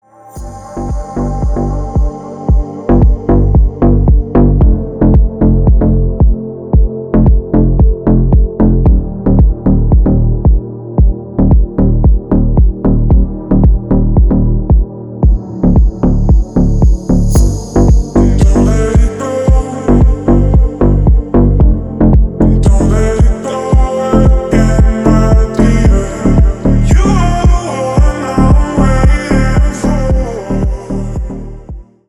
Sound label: Deep Dark Vocal Mix